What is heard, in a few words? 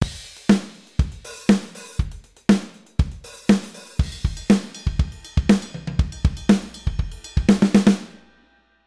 DRUMS, comp, filter bypass; shadowhills; 8to1